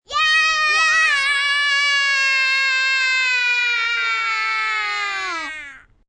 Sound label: Kids Cheer Long, Cheering, small group of children; Children Sound Effects